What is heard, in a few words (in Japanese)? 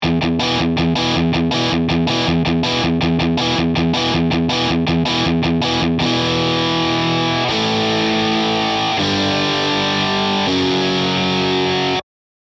Logicギター音源